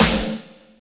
SNR, Tekno Industrial; East West

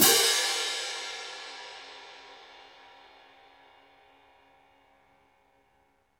CYM ORCH, Orch Crash; Roland LCDP03 Orchestral Perc; Orch Cymbals

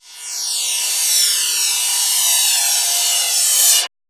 METAL SWIRLS; Spectrasonic Distorted Reality 1

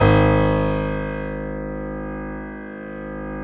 PIA PRO, Roland

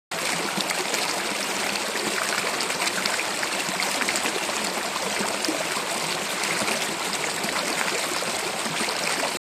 water

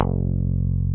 GTR E GTR, Clean E.Gtr; Roland LCDP02 Guitar and Bass; Velo Chord 1

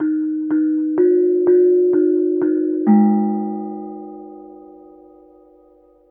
KENONG; Spectrasonics; Heart of Asia; KENONG PH

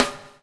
BUBL; Spectrasonics - Backbeat

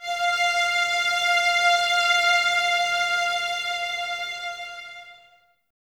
Violins F 5, String Ensembles; Light; Miroslav Vitous; 11 Violins

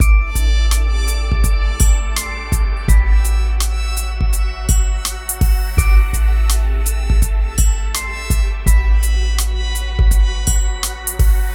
MIX, Hip Hop and R&B Drumloops

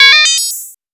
MOOG HAT; AMG - Vince Clarke Lucky Bastard

